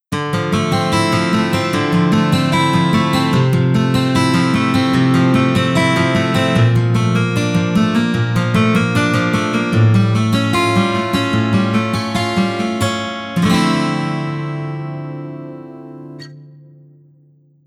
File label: Guitar, ringtones; Gigaset GS290